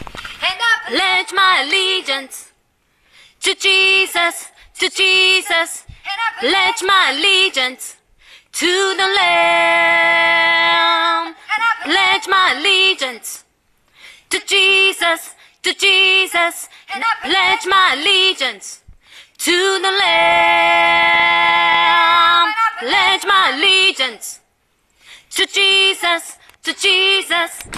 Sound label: baptize alto